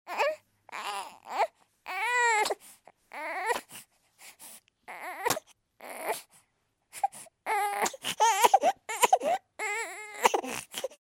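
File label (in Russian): похныкивает призыв; Плач новорожденного ребенка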